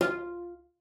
CELLO FINGER F4; Xsample VOL-5

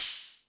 High Hat, Drum Machines E-MU Pro-Cussion

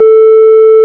ORG, Farfisa; Roland LCDP09 Keys of the 60s and 70s 1; Farfisa Flts; Farflt DBars

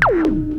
RESO, OLD SYNTHS; Rubber Chicken Software Sample Bank

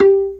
B3, Drawbars; E-MU Producer Series Vol. 2; More Studio Essentials

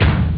bdrum; Amiga Soundtracker Sample Packs

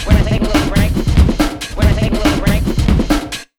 RUN 140, Big Beat Drumloops

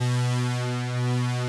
FAT P-10, PROPHET 10; Greytsounds VOL-1

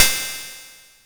cymb, cymbals

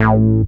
moog A; Techno Trance; Techno Trance Essentials

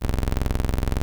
Prophet 5 F1; Hollywood Edge - Giorgio Moroder Rare Synthesizer Collection

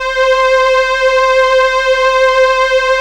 SIEL, String Machines

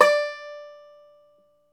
FLATTOP, Flat Top Banjo

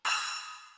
crash, Millenium MPS-400

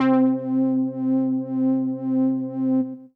Pad C, Synth Prophet One Shots; Electronisounds

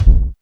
base drum; DRUMS

